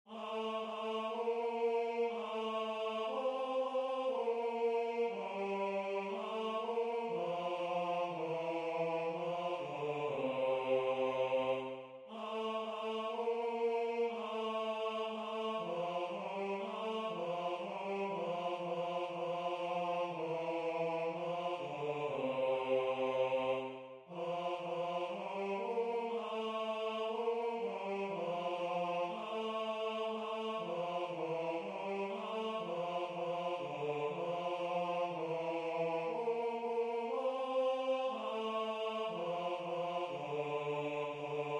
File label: Tenor